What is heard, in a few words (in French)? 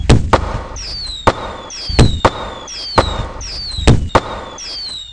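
feu d'artifice; TOUTEDIT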